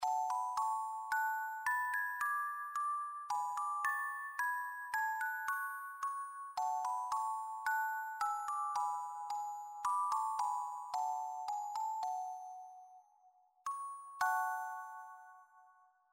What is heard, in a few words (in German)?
Russisch Spieluhr Celesta, spieluhren